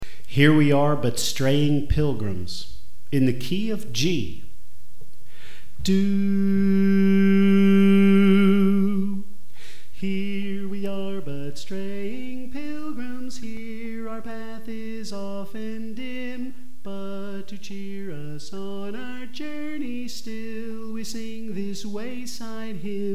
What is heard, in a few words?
Bass